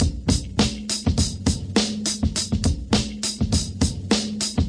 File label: hiphop the kick block, 102 bpm, drum loop; 101 to 110 bpm